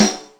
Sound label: Tamb Snare, Yamaha DTXpress Acoustic Snare